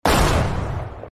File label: shot; ZombieBattle